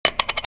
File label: plastic poker chip, clattering